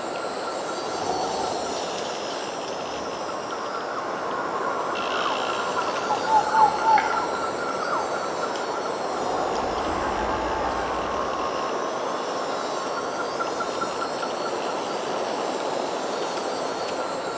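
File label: ambience mushy dungeon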